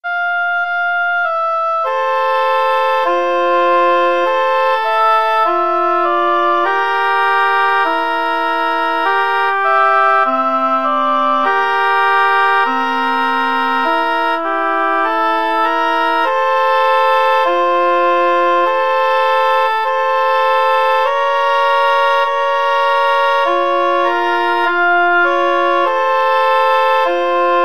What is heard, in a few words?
o sole mio, oboe duet